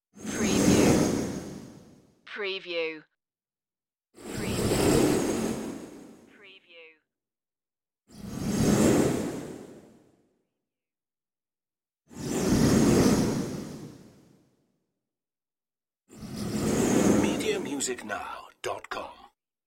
magic whirl swooshes

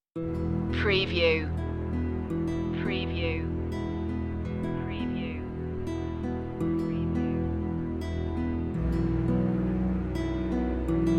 guitar and strings

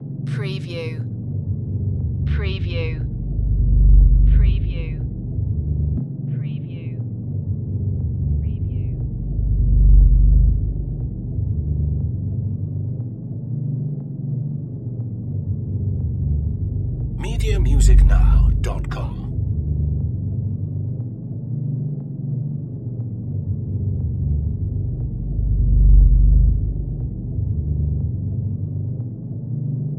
Fazed Bass

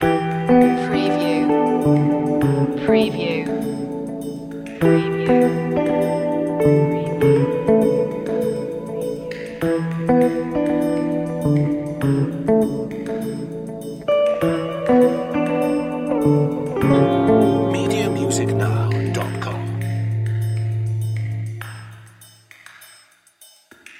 mystery bouncing loop

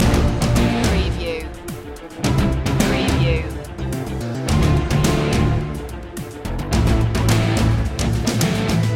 battle in 8-bit space loop

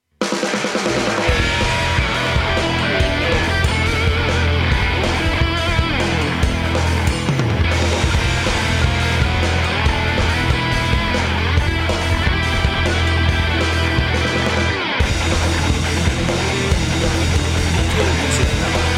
short and heavy

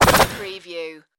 robot malfunction burst

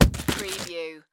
Head Roll On Ground

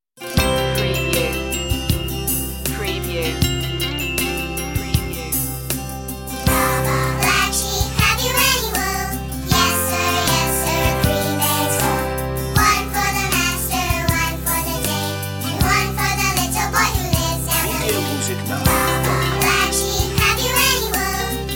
chipmunk vocal

